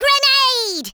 Worms grenade

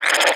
NPC Hit

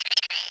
Zombie

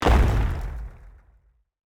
explosive trap explode

